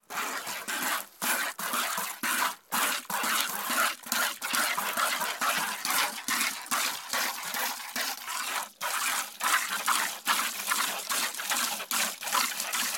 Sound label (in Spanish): ORDEÑANDO VACAS LECHE CAE EN BALDE DE METAL